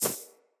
Generic Pickup Auto

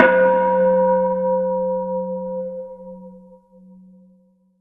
Bell; Warframe; Dojo Props; Bells

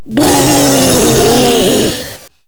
Proto Infested Ancient Vocal Attack; Warframe

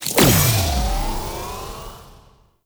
Speed Ability Start; Warframe; Warframes; Helminth Abilities

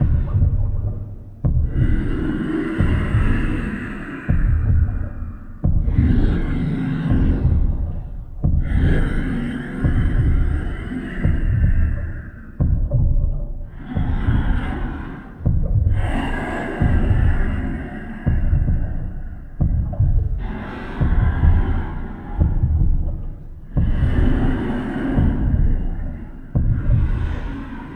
Dormant Vessel Alternate Loop; Ambience; Props; Warframe; Entrati

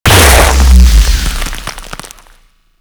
Hammer Boss Ice Missile Cast; Warframe; Grineer; Abilities

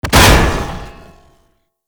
Tank Swing Attack Impact; Enemies; Abilities; Lasrian Tank; Warframe

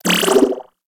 Mine Impact; Warframe; Riot Moa

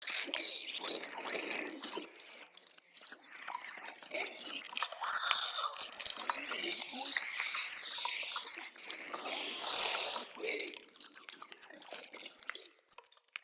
Critter Loop, Root Cave Ambience; Neathuns

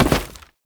Step Wood, Foley; Warframe; Oraxia

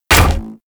Full Draw Shot, Weapons; Warframe; Infested; Cernos

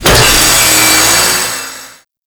Saw Impact Default, Blade Impacts; Warframe; Grineer

